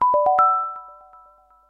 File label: vote start, glass; sourceforts1941; xenon